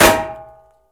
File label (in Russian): zvonkoe zvuchanie ot udara po metallicheskomu predmetu